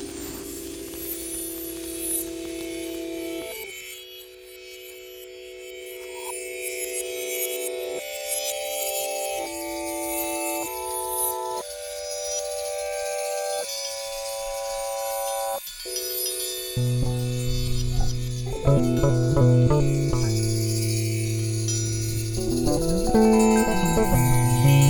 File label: Siren Song instrumental, Hip Hop